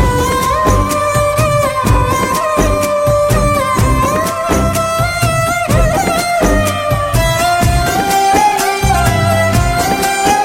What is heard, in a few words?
Instrumental Tone Ringtone